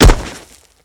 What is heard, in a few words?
Crash Body Impact Wall